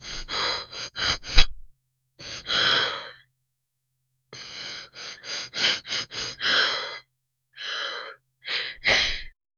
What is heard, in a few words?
the man sniffs sadly upse